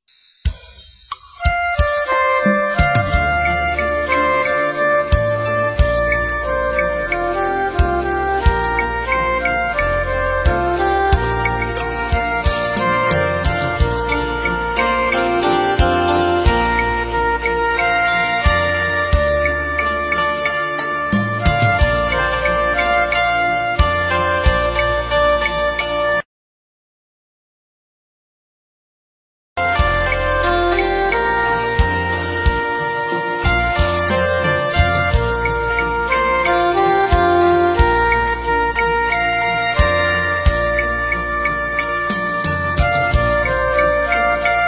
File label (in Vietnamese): nhac khong loi beat